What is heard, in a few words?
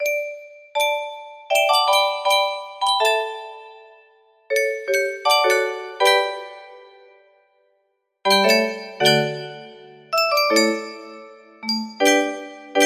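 music box melody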